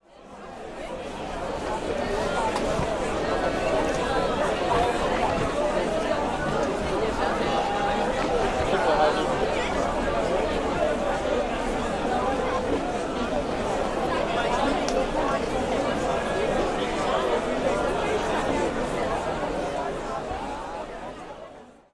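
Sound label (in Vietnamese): Âm thanh môi trường đông người nhộn nhịp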